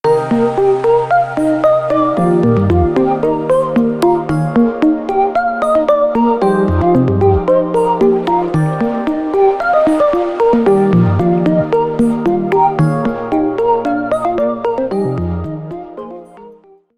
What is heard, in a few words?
Smooth Wave, ringtones; Samsung Galaxy M31